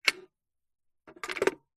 household telephone vintage rotary style pick up then hang up receiver gently